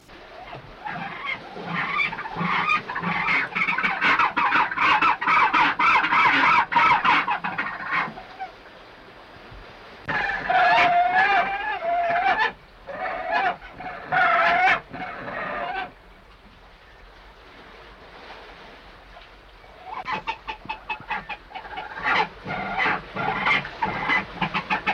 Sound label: Fiordland Crested Penguin